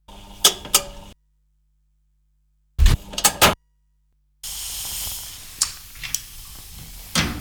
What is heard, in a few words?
oton cuts; techno 2000